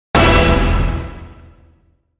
Hit Orch Lonely Heart E

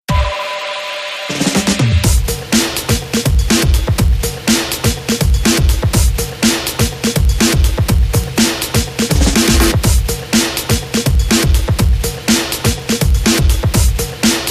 Guitz Beat

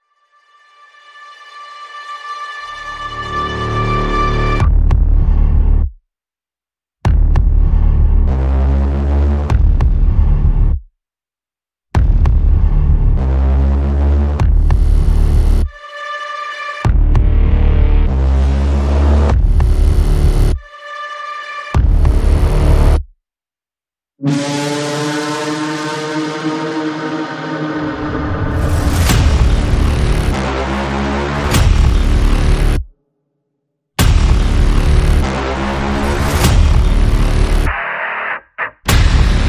Projectile

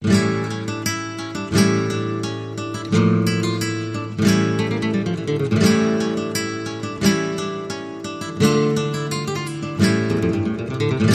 Spanish Flamenco Guitar Theme

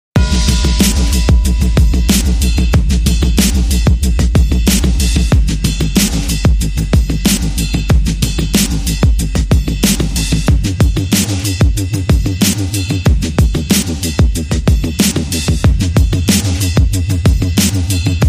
Dramatic String Short Sub G

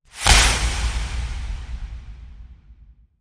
Cracking Branch Hit

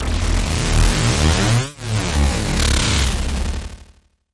glitch

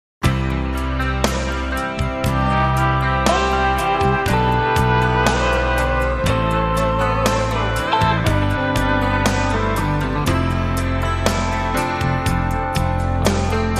Contemporary Country Ballad

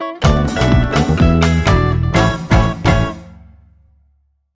Hit

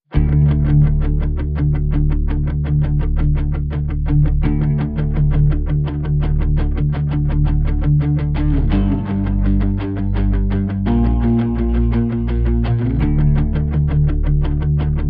No Perc

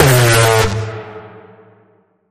Bend The Alarm, A sharp

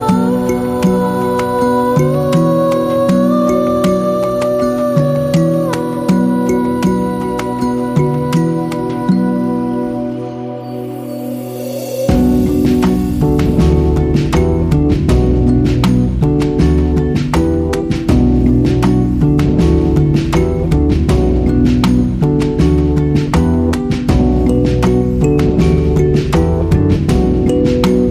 Elegant Downtempo

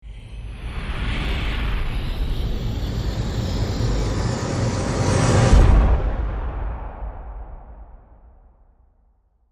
Hit FX Plasma Swell

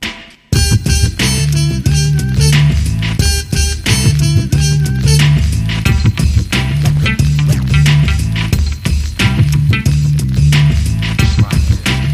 Scratchout

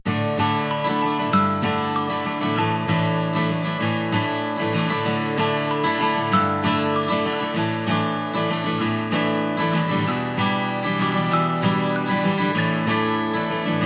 No Drums No Bass

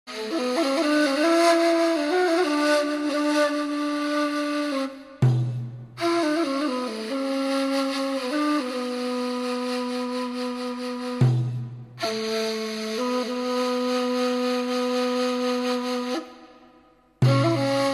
Persian Ney

